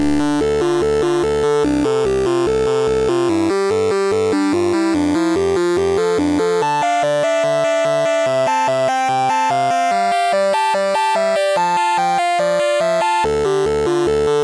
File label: 64 BASIC sid MOS6581R3